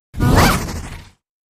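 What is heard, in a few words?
free spins pink light attack; wild worlds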